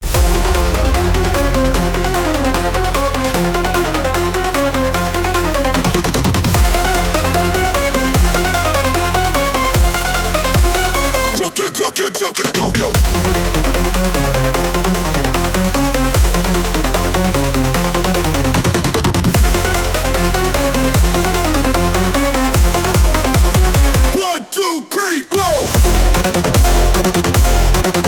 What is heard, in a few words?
brostep k pop fusion